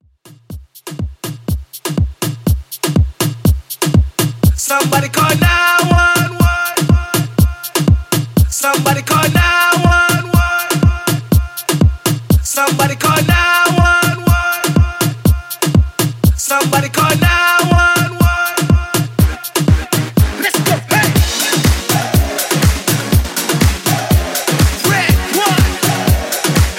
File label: Reton 122Bpm